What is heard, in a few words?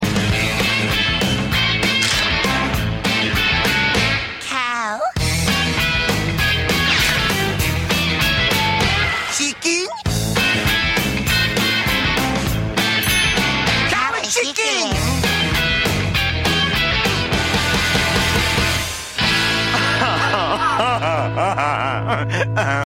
Funny Cartoon Theme